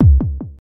old school verby bass drum c sharp key; kick

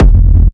industrial techno kick oneshot f; kick drum

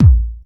steel kick drum sample a sharp key

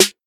airy steel snare drum g key

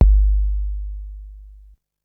00s huge bass drum one shot g key

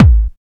good kick drum A key; kick drum samples

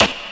short steel snare drum b key; snare drum samples

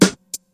airy sizzle snare drum sample g key

